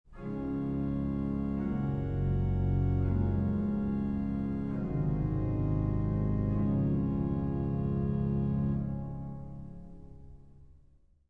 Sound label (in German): vergleich streicher